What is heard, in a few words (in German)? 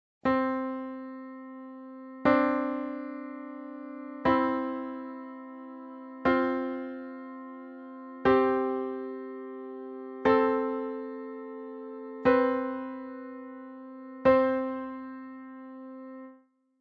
Intervalle C Aufwaerts